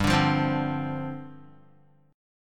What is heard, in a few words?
G 7th Suspended 4th G down Guitar